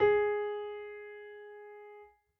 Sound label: piano g#; Golden Crayon Sounds